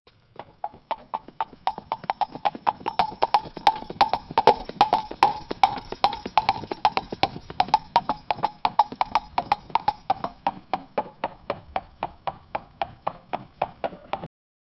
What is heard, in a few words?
horse galloping on a metal